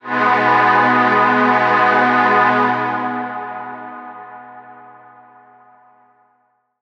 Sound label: Old Rave Pad; Zero-G Sound Sense Grime Scene; 90 s Sample CDs